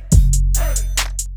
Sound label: smooth ok